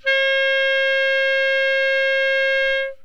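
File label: clarinet C; conservatorio; Csound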